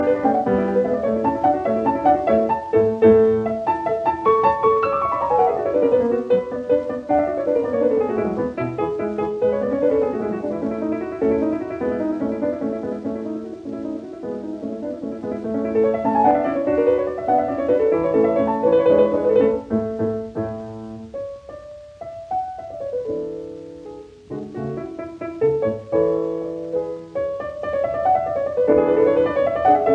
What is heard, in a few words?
Piano Sonata No 18 in D major K 576 I Allegro; Claudio Arrau